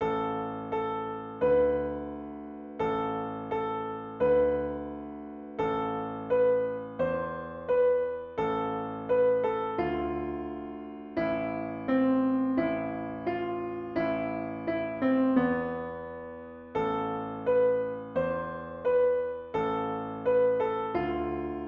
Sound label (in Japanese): ピアノ